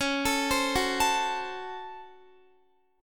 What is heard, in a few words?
C sharp Major 7th Suspended 4th Sharp 5th, Guitar; C#M7sus4#5; arpeggiated